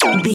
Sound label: sci-fi weapon laser small fun